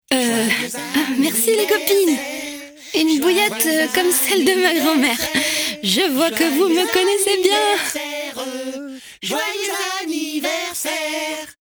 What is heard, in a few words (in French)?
bruit; MOBICLIC 69